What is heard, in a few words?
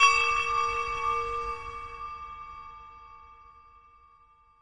deep dissonant bell; Bells